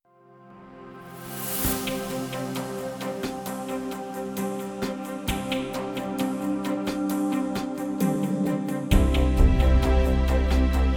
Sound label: Instrumental